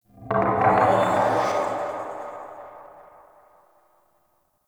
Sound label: Corrupted Ode One Dark Creak; Ambience; One Offs